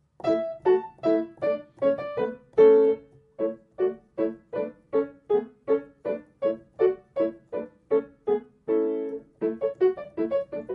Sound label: Rondino Piano Accompaniment; Hadjiev; grade v